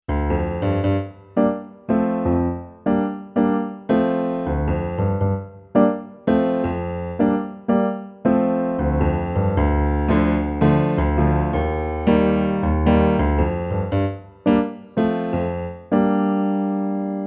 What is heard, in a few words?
Manhatten Grand